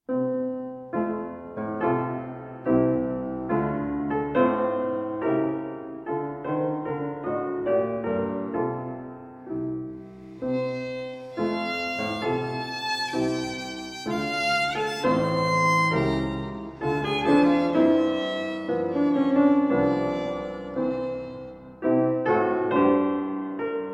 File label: Violin Sonata No. 2 in A Minor, II. Andante